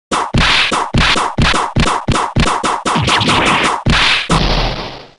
whip rampage

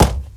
run

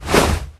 pray; ahlbi

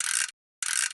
winding

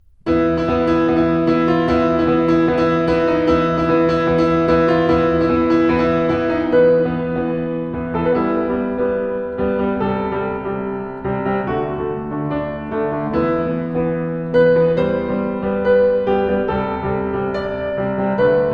piano

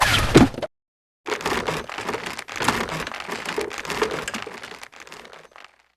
leaves; cooper

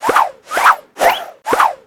swing

